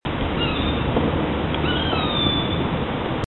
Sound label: coopers hawk; Birds; Hawks